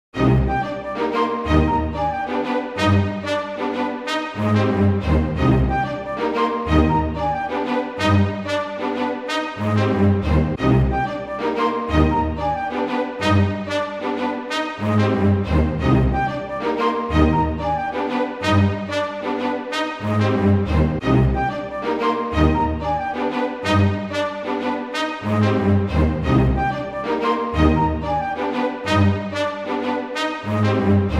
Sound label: radio jingle